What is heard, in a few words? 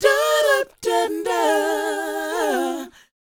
DOWOP A#, Vocal R&B DOO WOP; ILIO; Vocal Planet